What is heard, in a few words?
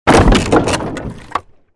created wooden barrier smash medium